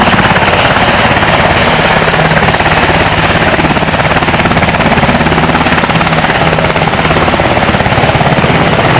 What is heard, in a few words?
Ah64 Rotor Sound; Mars Sortie